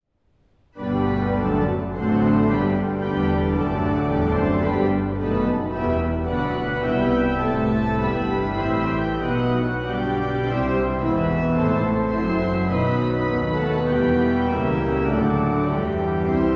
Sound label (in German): praeludium G Dur, orgeln; suessen ulrichskirche; merkel; op134